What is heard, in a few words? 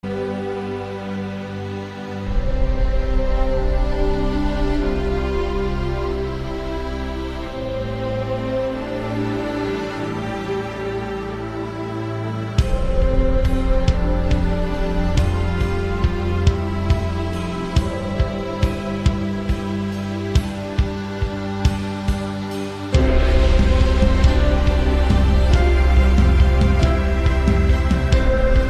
instrumental; Aeon